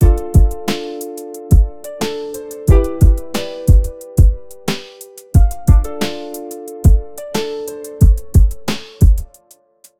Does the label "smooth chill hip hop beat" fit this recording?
Yes